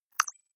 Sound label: click synthetic light, signale